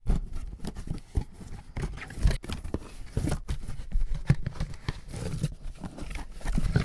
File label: fiddling with a box; human; NS Studios - ultra sound collection